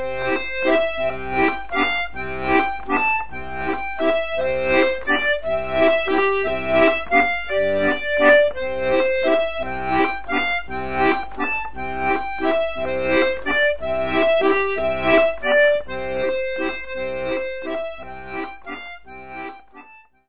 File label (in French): Courante de Lomagne - France